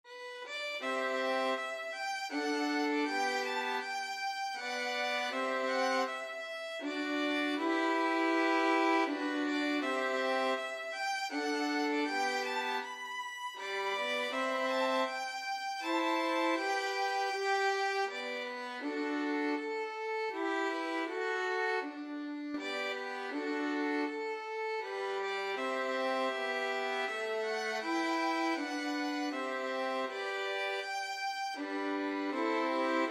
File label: violin trio; holst jupiter